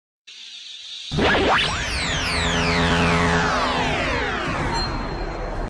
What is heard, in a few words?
gear